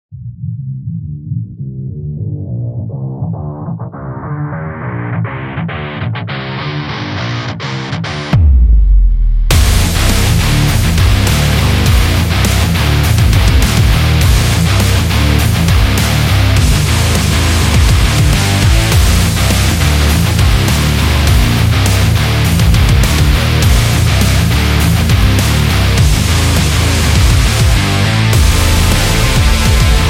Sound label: A Metal